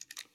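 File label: gear rattle weapon small; viper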